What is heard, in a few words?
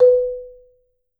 MSOFT B3, Marimba; Sampleheads - Dave Samuels Marimba & Vibes